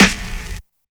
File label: Snare, 808; Snares; Sample God - Jahlil